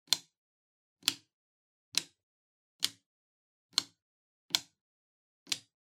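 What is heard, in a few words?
Soroban Upper Minus, abacus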